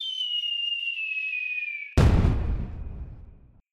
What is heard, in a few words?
fireworks